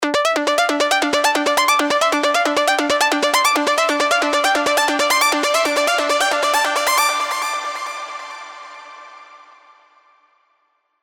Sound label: Trance Plucks; Cascade Collapse; alonso spire trance plucks vol 3